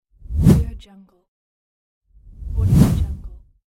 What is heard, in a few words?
medium speed swoosh